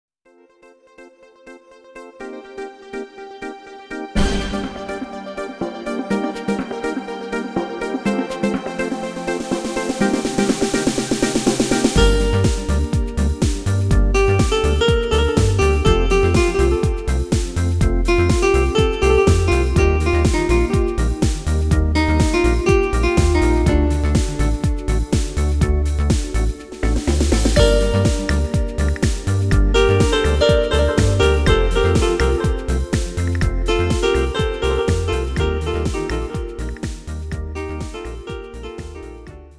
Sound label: Club Flamenco